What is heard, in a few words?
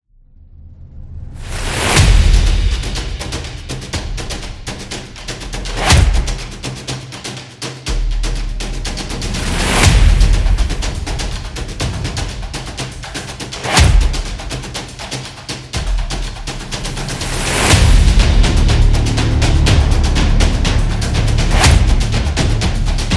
Epical Drums